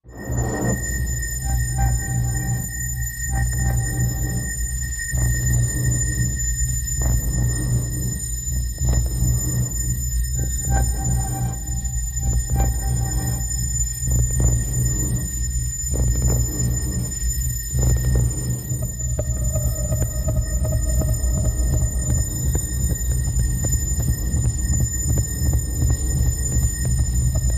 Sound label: Alert Averter Machine, Alert Averter, Machine, Pulsing, Steady; atmospheres